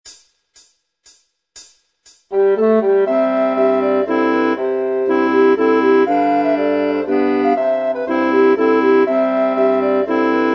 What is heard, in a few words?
Mazurka; Pitjesbak